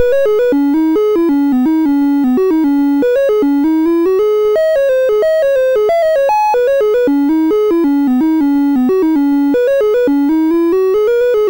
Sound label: sid MOS6581R4; Balloon 64